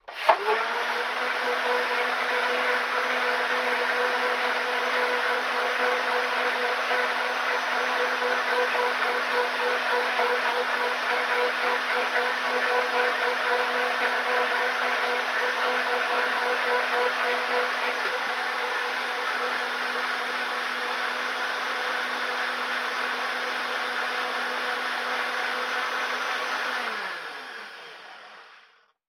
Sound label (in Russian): zvuki blendera i sokovyzhimalki